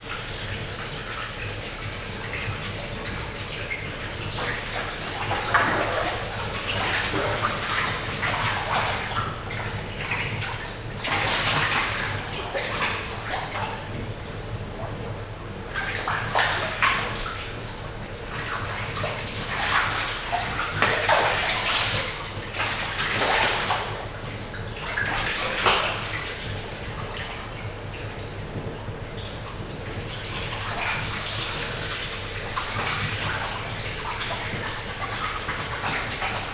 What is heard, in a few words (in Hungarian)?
kispingvinek zárttérben úszkálnak, pápaszemes pingvin; jaszberenyi zoo 2015